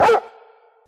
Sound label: dog bark; Animals